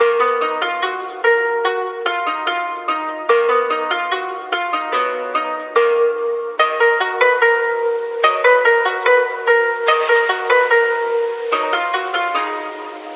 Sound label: guitar